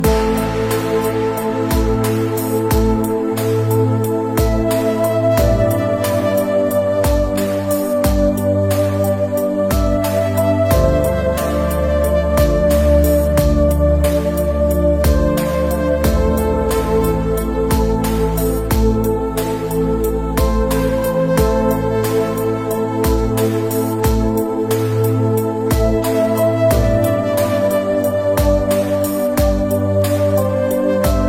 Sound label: Beautiful Music Ringtone